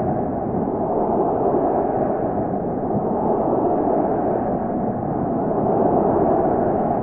Ponies Cheering; Use in Mane 6 scene outside of building, Loop until end of episode

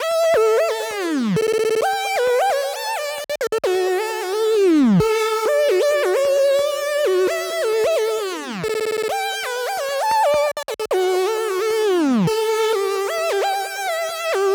Weird LFO synth; 130BPM; stems; Pony Fan Music Remix Stems

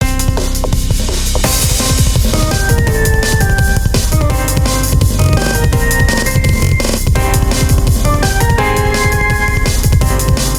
Breakbeat Medley; Pony Music Archive; ∕mlp∕ Plays; ＞No Fun Allowed; ppg, fosters, mlp

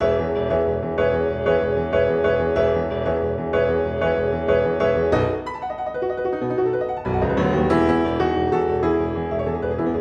Cooler Insane Speed Piano Remix

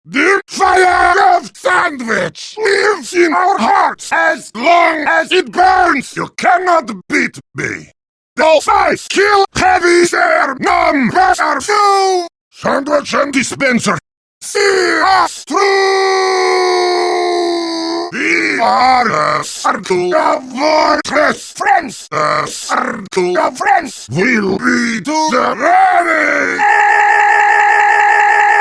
The Heavy Carol (Acapella)

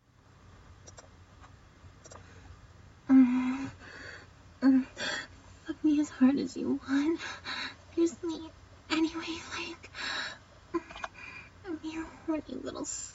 short femsub schlick

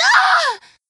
Short Scream; Lewd SFX

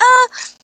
Moan

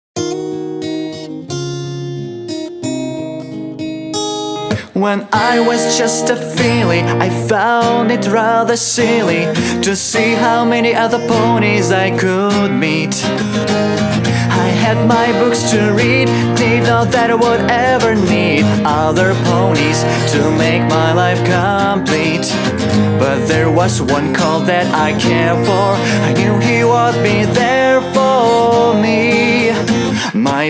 Acoustic Cover

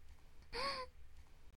Breath; Lewd SFX

